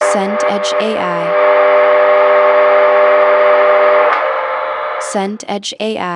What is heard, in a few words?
bed extractor fan